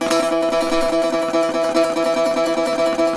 saz; Roland - LA Composer vol. 3

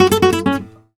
Flamenco Guitar Picado, f#; Best Service ProSamples vol.46 - Flamenco

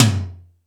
Toms 1, drum machine

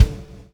PULL, Backbeat; Spectrasonics - Backbeat

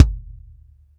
BD, Bassdrums, Roland TD-20

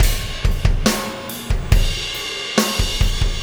Impact Crater Beat, Processed Drumkits

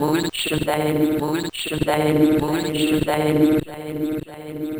Mouth Music, Vocal; Sonic Foundry (Sony Creative Software); Myths of Technology

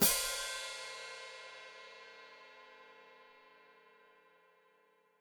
Hat Closed Open, Hi-Hat

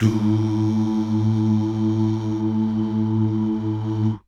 DUUH

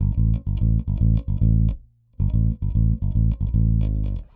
Rock, Bass; Bass X

